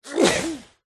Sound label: human male man sneeze into cloth or arm muffled; zapsplat